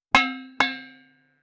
sound effect of, time capsule

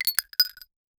arpg ui equip chainmail

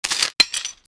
tool breaking

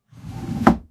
wood drawer opening from; interior

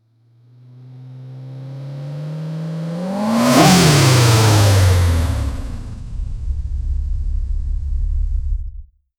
sound effect, lamborghini

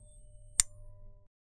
light bulb flickers

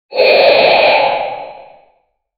death sound for casual platformer

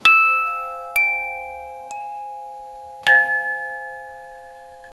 the old music box was

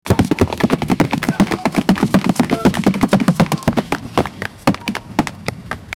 a king sitting on a, horses

